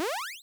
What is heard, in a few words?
Snake Eat